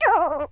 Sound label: uh; yoshi; SM64 CO-OP